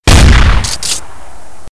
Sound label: gunshot drobovik